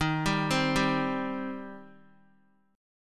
D sharp 6th, Guitar; arpeggiated; D#6; x,6,7,5,7,x; JGuitar

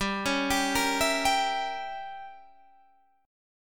E Diminished 7th G, guitar

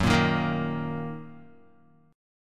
Fsharp 6th down Guitar; F#6 strummed

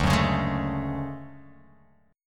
D Major Sharp 11th D Guitar Open F, chord; strummed; JGuitar

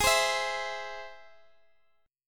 Ab Augmented Ab Guitar Standard; strummed

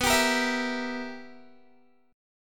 B Major 7th Suspended 4th Sharp 5th B down Guitar; BM7sus4#5; strummed; 4E,4A,5D,5G,5C,6F

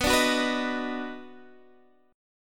Eb Minor Major 7th Sharp 5th B, chord

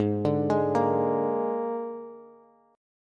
Ab Suspended 2nd Flat 5th Ab Bass; arpeggiated; JGuitar

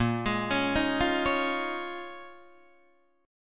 Bb Minor 11th Bb down Guitar DADGAD

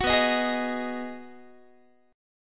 B 6th B down Guitar 4A 4E 5A 5D 5G sharp 6C sharp; strummed

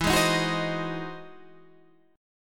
E Augmented Major 9th, Guitar, Half Step Down

